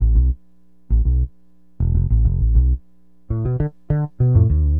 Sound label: BASS, Acid Jazz Funk